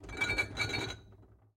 Glass Clinks Glass Bottles

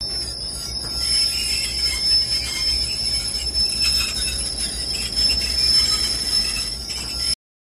Loco Brakes Lng Grind

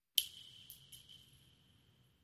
Shell Casings Single

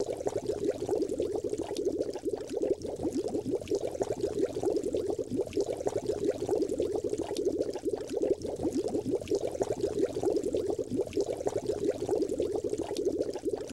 Bubbles Loop From Aq

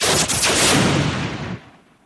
Hand Phaser Sngl Sho

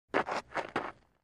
Baseball FS Cleats; baseball fx & games

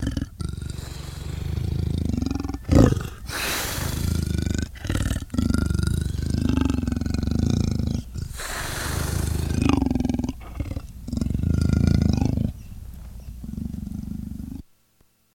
Leopard Growling Bre; big cats

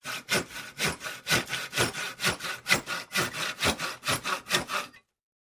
handsaw sawing

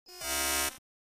Button Sci Fi Beep T, buttons & beeps

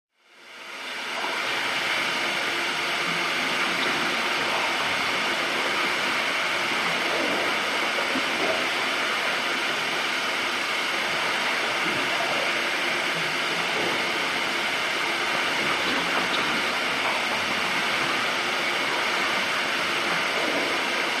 Amb Eerie Televisio, drones & tones

